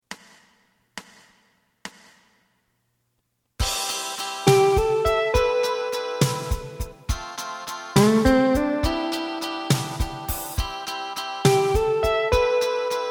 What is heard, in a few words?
bass